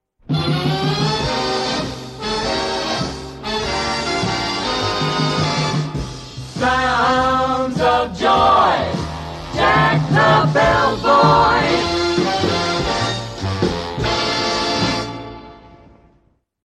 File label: Radio Jingle; WJBK-AM; Radio 15; Jack the Bellboy